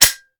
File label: mech, weapons; CopsNRobbers